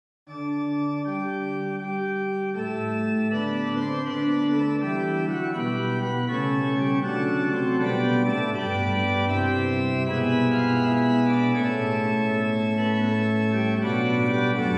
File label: Messe Paroisses Gloria, OrganMusic; Et In Terra Pax; Couperin Francois; Souvigny